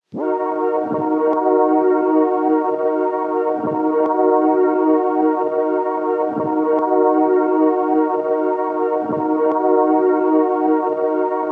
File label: Tape Loop Pad - D# Min; Tape Loops; Pads; Cymatics - Eternity Sample Pack